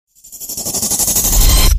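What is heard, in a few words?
Reverse Shell Casing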